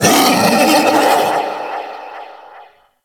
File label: Kubrow Feral Vocal Call; Enemies; Warframe